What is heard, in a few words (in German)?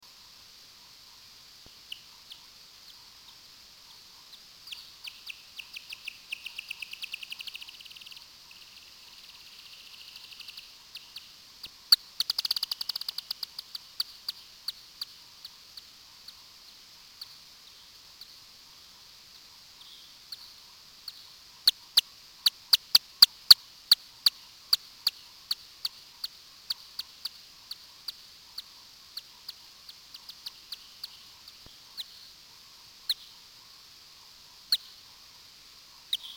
Mopsfledermaus Balz 10x